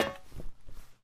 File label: ladder, Footsteps; zps; Foley